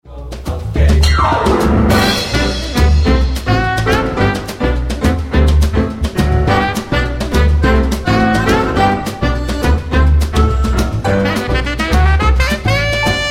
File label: Tango